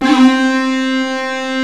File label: SCREAM LEAD, ENSONIQ VFX; Rubber Chicken Software Sample Bank